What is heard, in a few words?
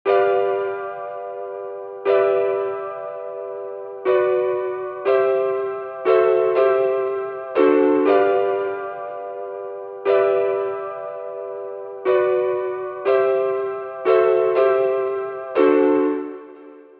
G 7ths bridging